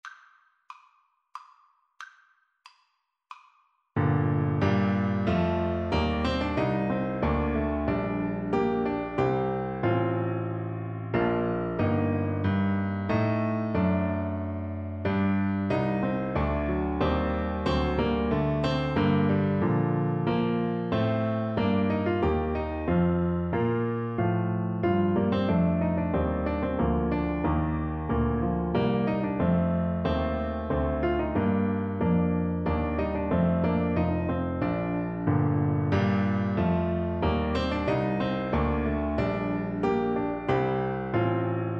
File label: rondeau, Bassoon